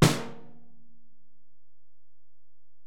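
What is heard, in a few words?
Acoustic Snare, Alesis DM-PRO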